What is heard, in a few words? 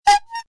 wacky horn